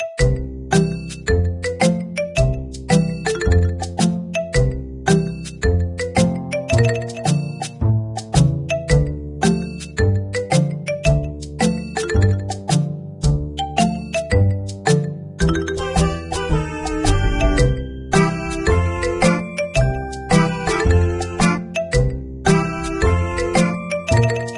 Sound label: Lobby